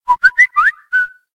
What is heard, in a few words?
whistle